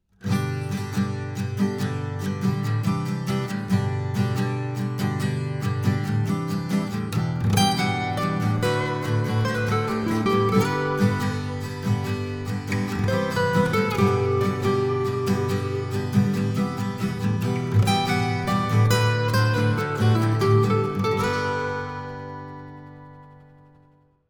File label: Acoustic; Scarlett 2i2 Studio; focusrite